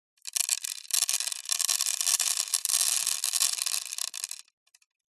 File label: beads plastic small handful drop sprinkle wood; foley